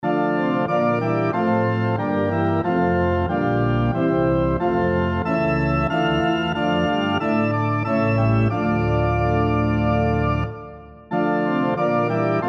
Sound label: SATB on Piano Example